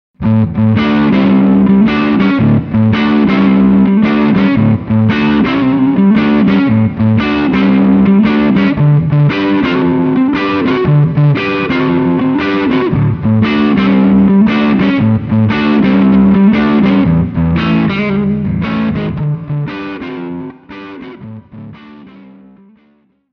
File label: LP Standard